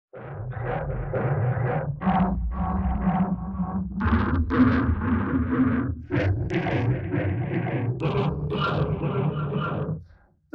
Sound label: Tension Mounts